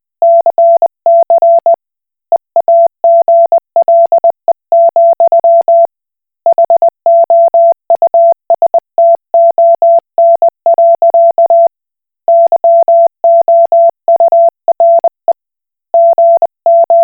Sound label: Koch Morse